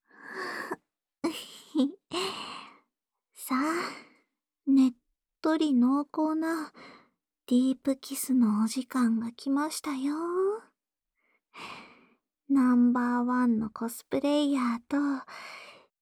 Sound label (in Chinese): 濃厚舌吻